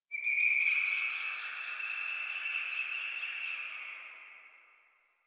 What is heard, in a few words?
Tree Distant Critters Happy Calm; Ambience; Warframe; Duviri